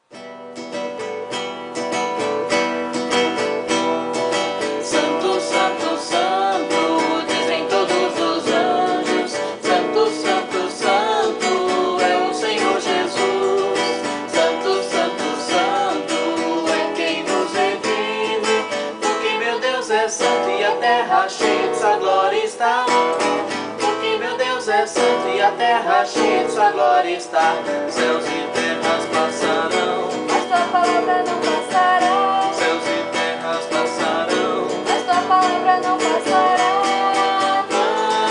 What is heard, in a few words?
vocal